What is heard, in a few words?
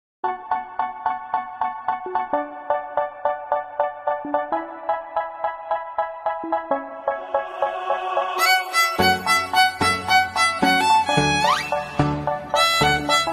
violin